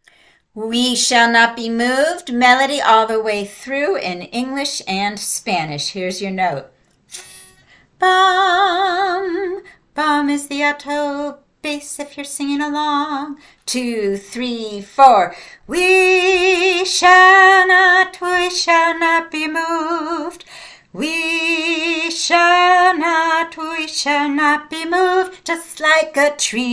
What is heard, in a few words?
melody; We Shall Not Be Moved